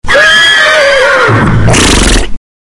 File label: Animals Horse; Very-Funny-Ringtones